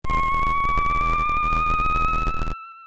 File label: possess; ff3; ff3us